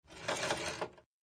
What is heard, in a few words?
crank; p00gie